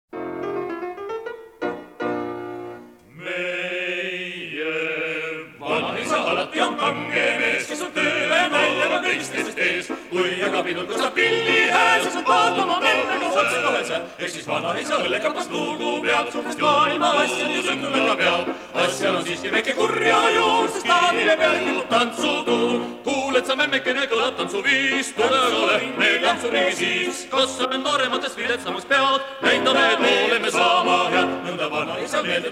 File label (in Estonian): polka